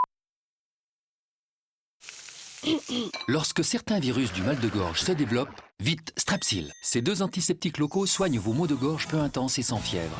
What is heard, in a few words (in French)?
raclement de gorge; Strepsil